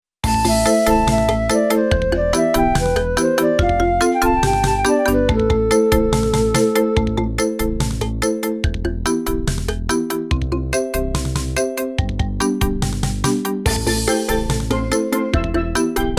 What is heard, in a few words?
Weapons Shop; Avalon